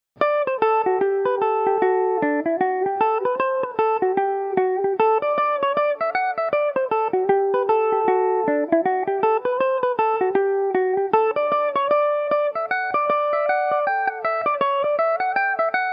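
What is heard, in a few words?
D mixolydian, irish mandolin; humours of carrigaholt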